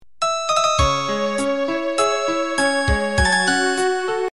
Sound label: Romantic Ringtone